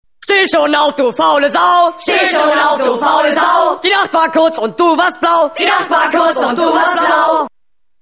army wecker

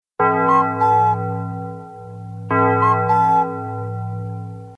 kuck kucks uhr, sms ton

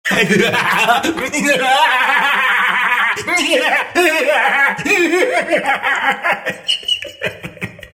psycho lachen

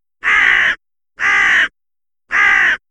raben und kraehen klingelton rabengeschrei

